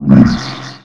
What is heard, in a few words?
ROLL, Disjointed Textures; USB Soundscan vol.33; AKAI; CHAOS